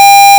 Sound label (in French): Avertissement; MOBIB